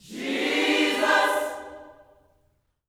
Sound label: GOSPEL CHORD, Vocal Planet; JESUS CHD